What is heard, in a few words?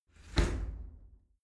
shower slide door close hit regular